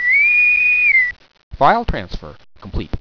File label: xfer done